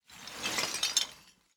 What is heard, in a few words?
rock tiles shaking; matter mayhem; soundmorph